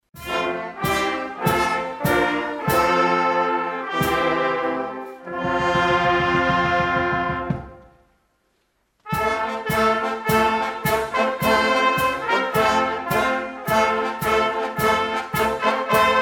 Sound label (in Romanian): Fanfara; Elim Viena; Duminica dimineata